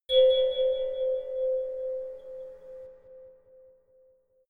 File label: melodic odd tine bell high